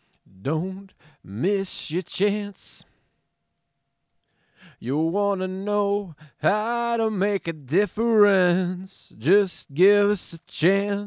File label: vocals